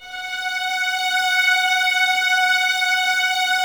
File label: F#5, 11 Violins, Soft; Miroslav Vitous